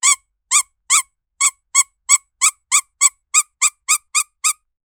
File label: squeaky rubber duck sound